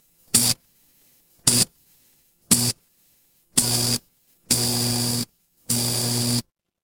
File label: fire electric zap